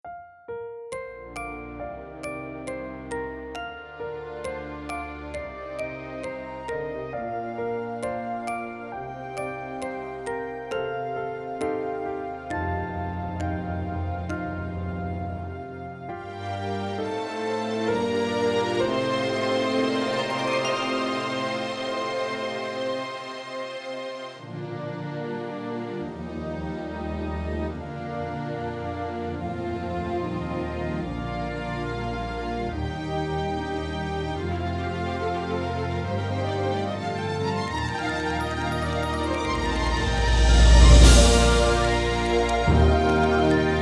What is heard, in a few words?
Instrumental Cover